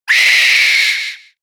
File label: damage